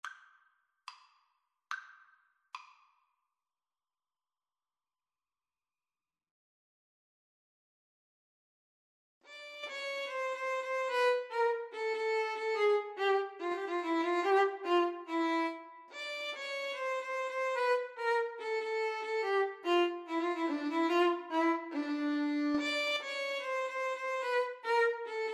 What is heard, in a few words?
Violin Cello Duet; bizet habanera